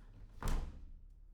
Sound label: door slam; IEEE AASP